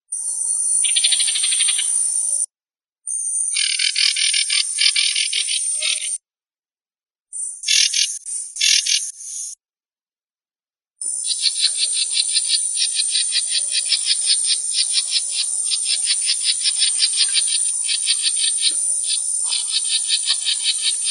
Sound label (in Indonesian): suara alarm burung ciungair coreng kalimantan